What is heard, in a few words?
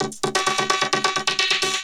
Vocobeat; Sonic Foundry (Sony Creative Software)